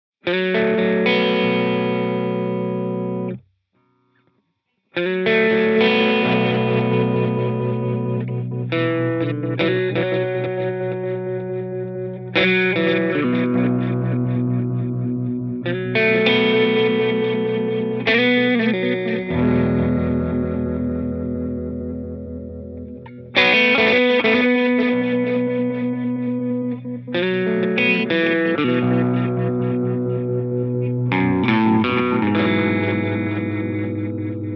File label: Stutter Chop